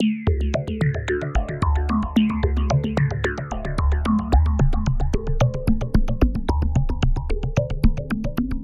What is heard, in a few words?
NRG Arp, trance